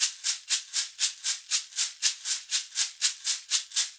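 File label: shaker, Percussion Loops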